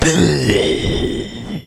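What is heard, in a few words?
Zombie Pain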